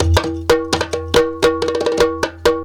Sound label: sabar groove; Best Service; World Colours